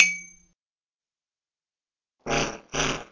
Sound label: Boxing; Talking Roby the Robot